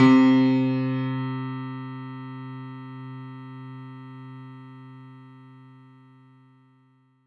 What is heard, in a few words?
C3, Synth Line; 90 s Sample CDs; NiceBeats; SynthLine VOL-23